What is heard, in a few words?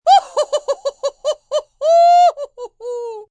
Laugh Round, Laugh, female, rounded opera laugh (human voice); Laughter